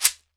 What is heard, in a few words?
SHEKERE, African Perc; E-MU Producer Series Vol. 7 – Old World Instruments